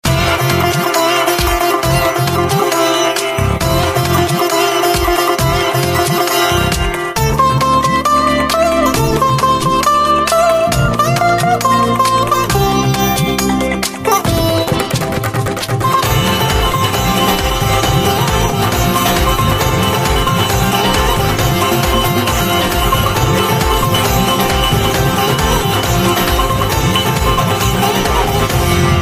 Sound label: Sitar; Uninor